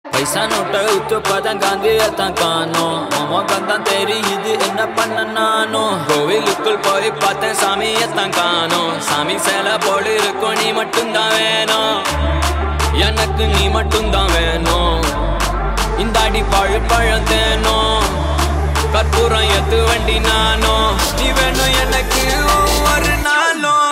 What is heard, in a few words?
Paisa Note Ringtone